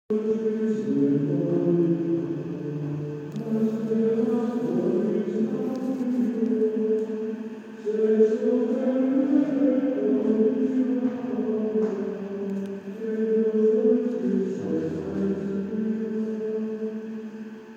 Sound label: Gregorian Chant In A Church In Spain